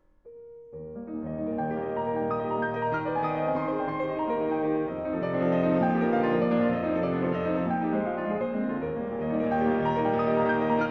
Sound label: En Mi Bemol Majeur Vivace, Piano, Solo, Preludes; Chopin